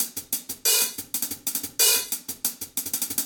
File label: hihat